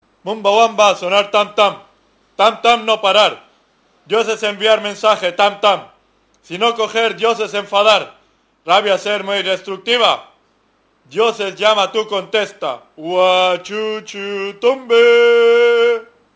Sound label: Ring Tone Africano